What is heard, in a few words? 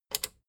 plastic button small latch click disable fast